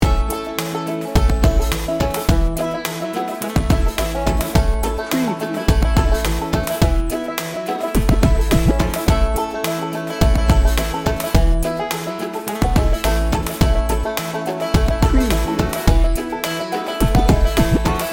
hip hop bluegrass loop

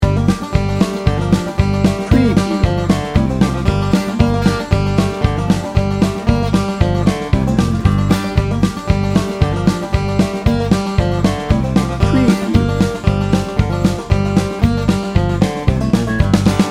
hillbilly chaser loop

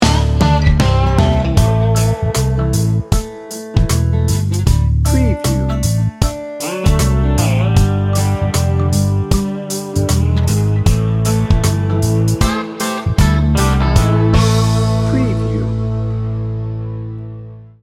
country swamp

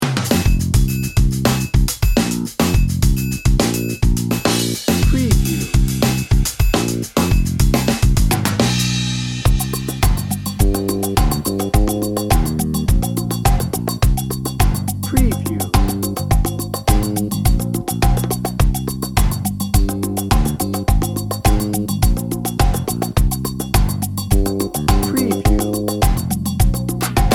latin carnival dnb

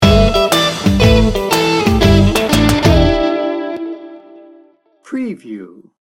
quirky sting bumper

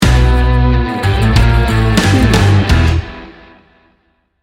southern blues rock sting bumper

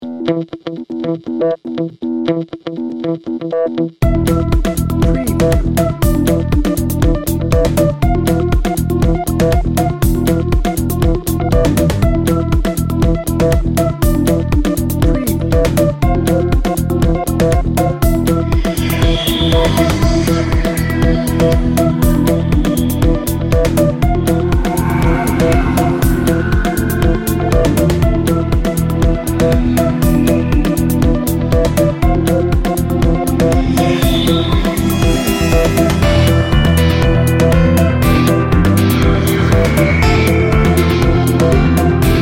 upbeat electro-pop

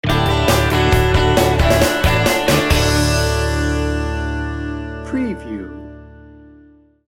fresh and friendly pop sting bumper

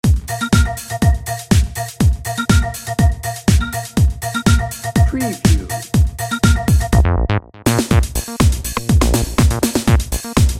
dancing robots bed

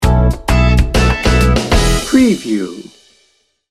lazy day sting bumper

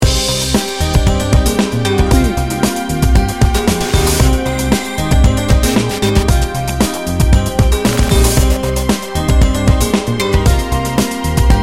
crime story

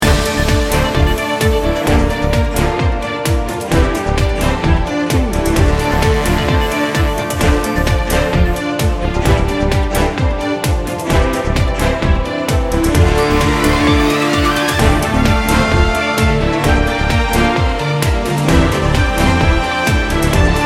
heroic power

